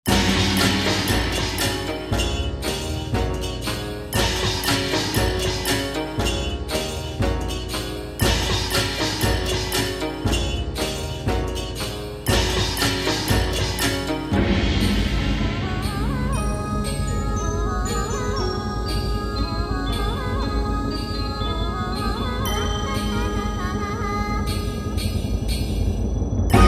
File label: Chinatown, Music Library